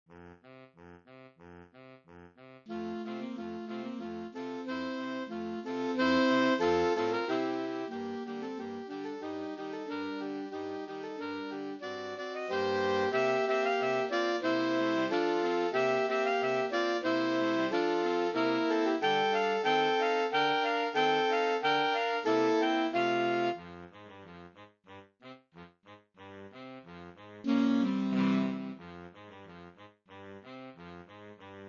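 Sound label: Schubert Rosamunde Ballet, saxophone; Saxophone Quartet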